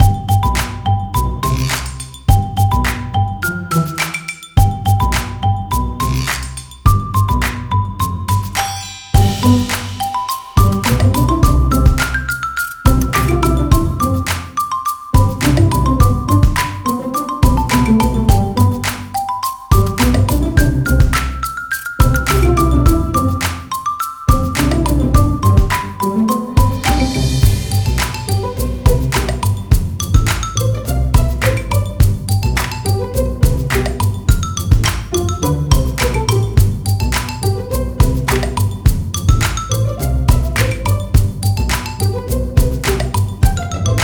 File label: Tromping Along - Loop; Holyday City Reloaded